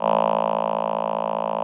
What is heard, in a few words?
VP-330, String Machines